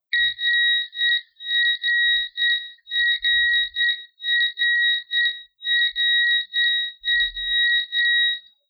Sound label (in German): Sinus sehr seltsam